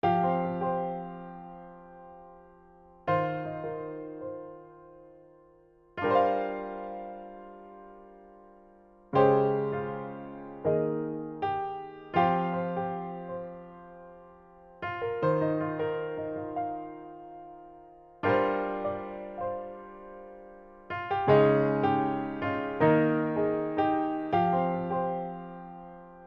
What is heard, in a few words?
Introspective Piano Etude